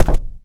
plaster, footsteps infected run

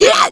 hunter pain short

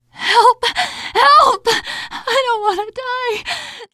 dying, teen girl; player; survivor; voice

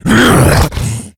rage, infected, male

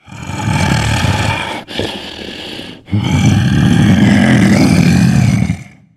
tank breathe; voice, idle

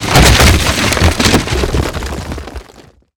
smash rock collapse, physics destruction

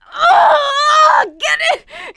scream while pounced, survivor voice; producer

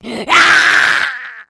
rage, infected, female

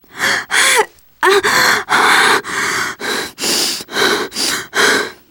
exertion critical, teen girl; player; survivor; voice

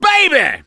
positive noise, survivor voice; gambler

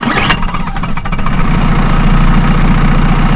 river barge loop

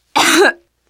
cough, teengirl

